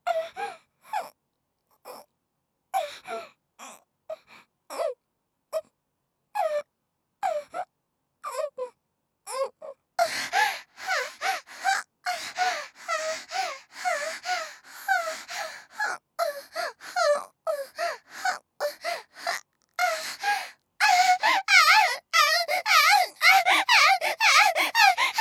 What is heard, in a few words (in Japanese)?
喘ぎ; 現代版とらこさん; いろいろあって成長したご様子; 当時のシチュを再演